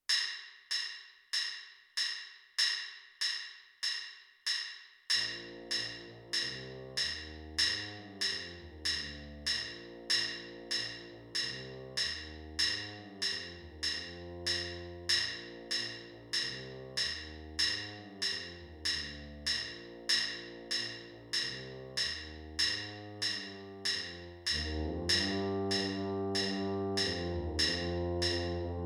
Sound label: sawmill creek tuba